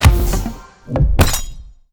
defeat

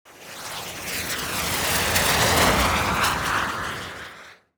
plane

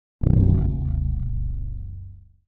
energy body

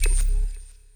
ui room select

